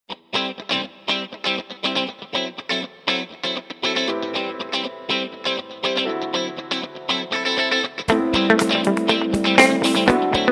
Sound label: Jazz Guitar; Hisense; A5; ringtones